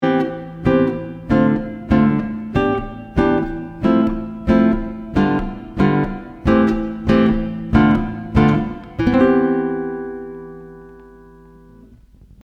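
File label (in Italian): Esercizio, Chitarra sola, pratichiamo con l'accompagnamento; Guitar Club Maggio 2025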